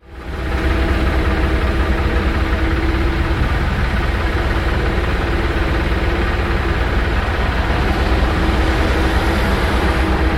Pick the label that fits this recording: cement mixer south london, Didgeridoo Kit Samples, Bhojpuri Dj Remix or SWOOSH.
cement mixer south london